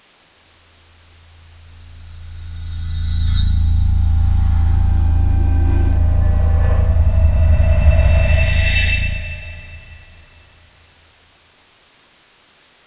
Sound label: ghost